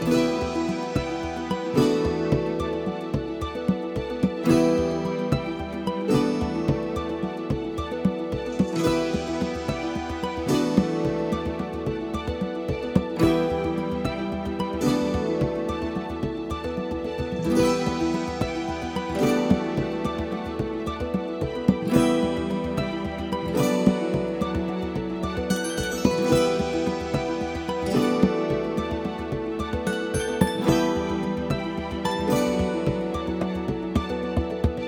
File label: energetic loop; Shu; ps vita ps4 switch windows